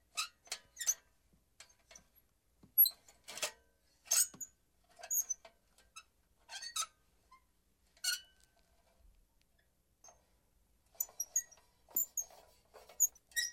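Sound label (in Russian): звук раскачивающегося фонаря на ветру; Звук раскачивающегося фонаря от ветра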